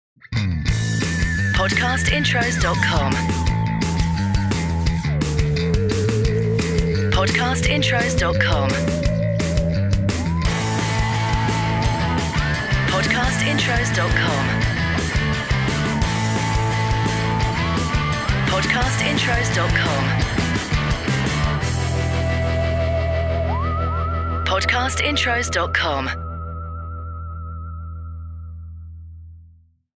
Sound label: ROCK Surf; Podcast Watermark